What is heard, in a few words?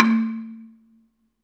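Balafon 2 A2, Asian Traditions; Soundscan vol.60; AKAI; BELAFON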